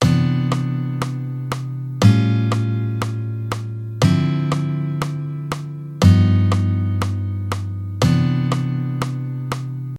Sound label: Shell Voicing, jazz guitar